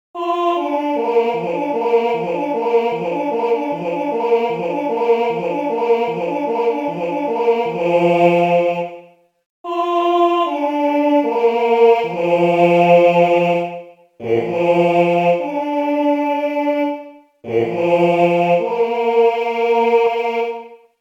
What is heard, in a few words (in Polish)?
Lis na rozkladzie, sygnaly mysliwskie; sygnaly mysliwskie ze slowami